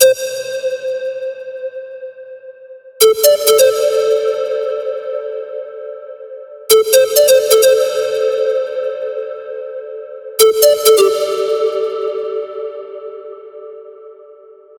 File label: The Flute; 90’s Eurodance